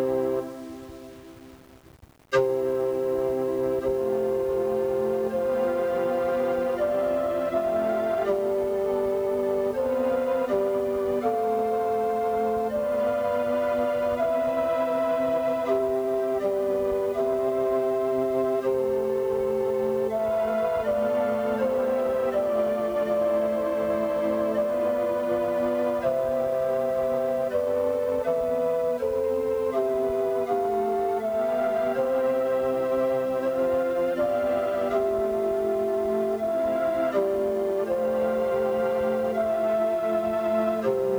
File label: CHORALE